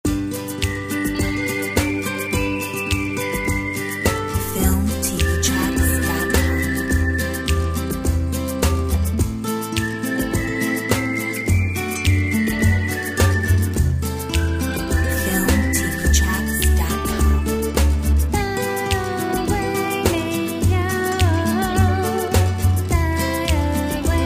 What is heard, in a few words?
Little Paradise Whistle